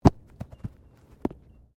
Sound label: Body movement Tumble Light; Body movement foley to simulate falls and deaths; Rolling; Objects; SoundEffects-Foundation1